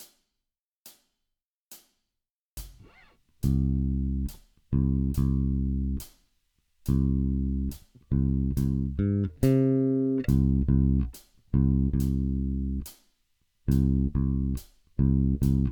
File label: fills in, baixo; funk is fun